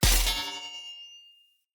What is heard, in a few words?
Short Sword Medium Parry Perfect Block Impact, Weapon Impacts; Player; Swords; Combat